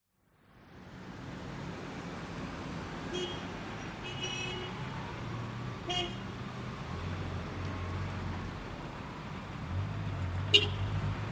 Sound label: city outside hotel ambience cars pull in away horn honks distant voices late night; doha qatar